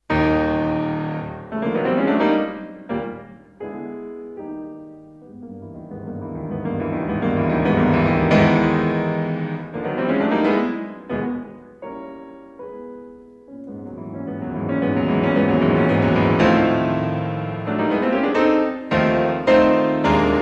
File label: Polonaise in A Flat Major